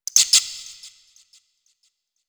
environment forest bat cackle; gamerip

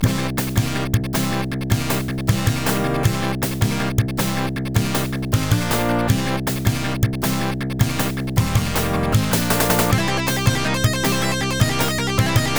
Win Music; Toughman Contest; Genesis

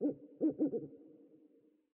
Amb Bird village Owl

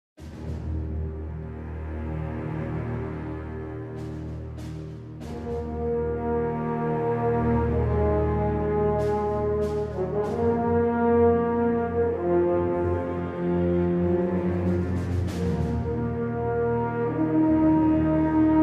Live Orchestra; Huxley Got Hit!; Call of Duty 3; PS2; PS3; Wii; Xbox; Xbox 360

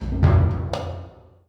Street Cred Gain

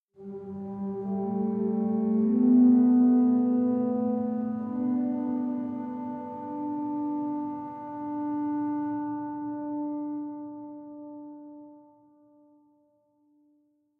Organ Mid

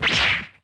Punch

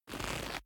Leather Foley; The Town

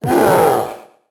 ogre pain

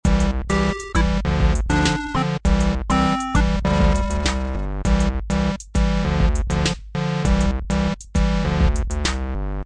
c64 music menu; crazy chicken

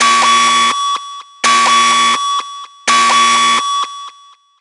siren; Yo-kai Watch Busters Akanekodan; ubaune siren1

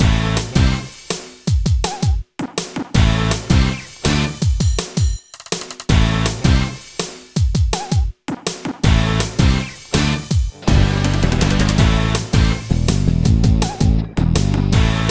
BGM Exercise

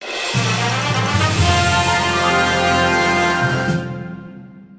Intro Fanfare

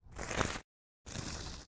Death Drag; portal quest; android

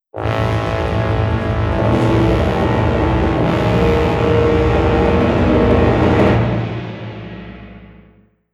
Boss Approaching; Dungeon Defenders

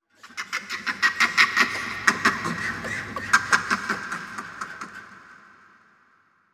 creature cackle; environment

